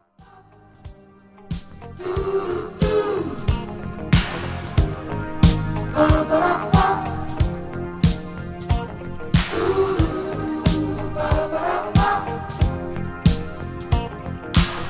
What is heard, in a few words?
bass